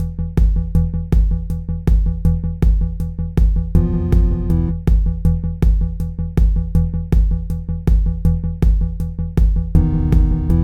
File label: tension